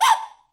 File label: Bird White Collision; fowl